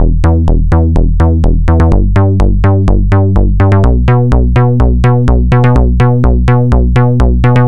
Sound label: Disco Fever; LICK; Best Service ProSamples vol.48 - Disco Fever; AKAI